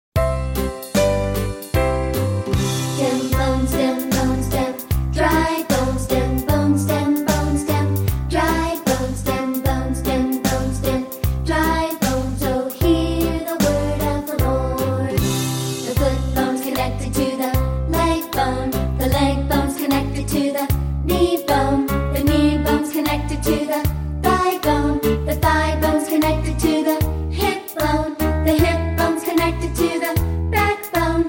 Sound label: Childrens Choir; Dem Dry Bones